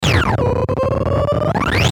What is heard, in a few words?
Warble Ascend Descend Laser